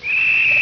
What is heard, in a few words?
whistle